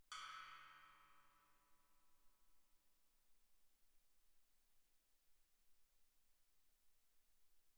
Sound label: splash stick normal pp, suspended cymbals; Percussion